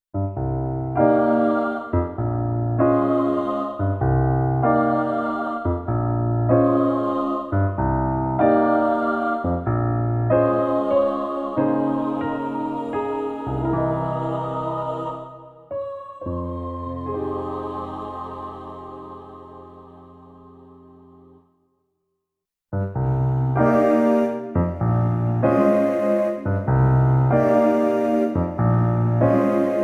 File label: Piano n Voice